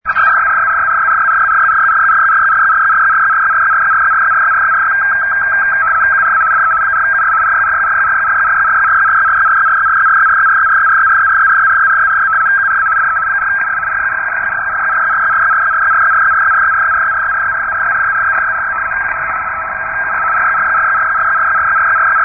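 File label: RTTY 75 baud; unificyp nicosia '4us'; rainer brannolte, d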